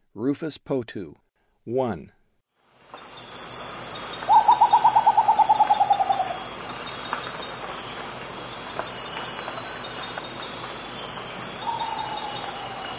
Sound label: Rufous Potoo; S. America; Eastern Lowlands